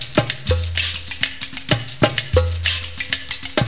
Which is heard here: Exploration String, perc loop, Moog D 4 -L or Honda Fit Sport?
perc loop